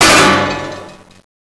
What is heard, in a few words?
bust metal, debris